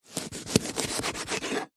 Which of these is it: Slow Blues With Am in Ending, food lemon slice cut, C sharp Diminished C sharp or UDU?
food lemon slice cut